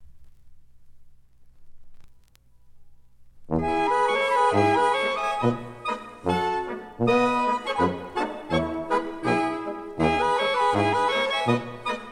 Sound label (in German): Schottischer